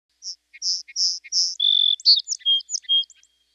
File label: sparrow song; birdsong